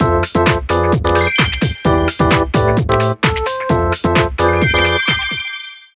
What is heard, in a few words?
emoticon